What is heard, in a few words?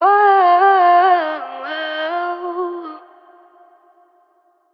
Ad Lib Wet, F Min, Vocals; Cymatics - Eternity Sample Pack; Ad Libs